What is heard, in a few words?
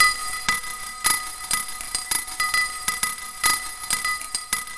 Jangling Glass; Metal